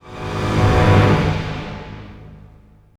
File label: Stinger; Ben 10 Protector of Earth; Wii